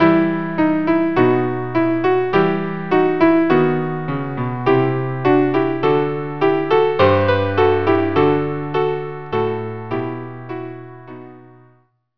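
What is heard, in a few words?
viola piano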